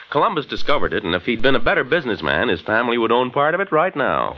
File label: both waves